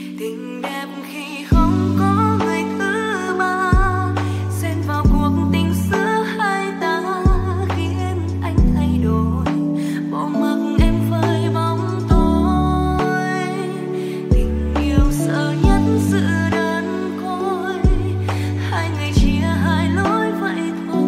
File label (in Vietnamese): Nhac Chuong Lofi